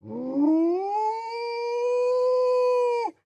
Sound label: Alpha wolf howling